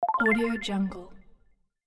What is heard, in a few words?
scoring point